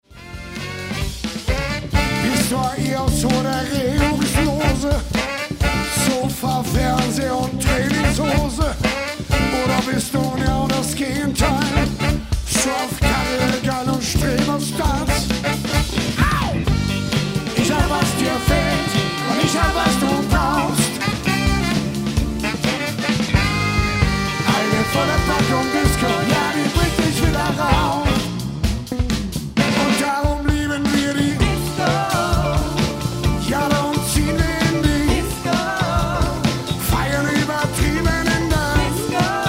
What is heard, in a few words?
Disko bass Vocal rev back